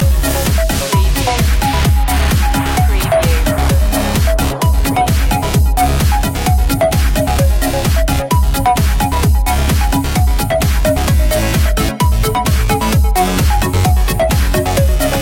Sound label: crystal rain loop